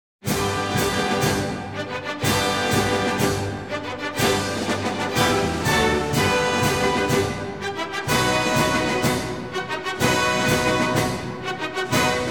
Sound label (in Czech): Fanfárový Pochod, Hymna; Freslandu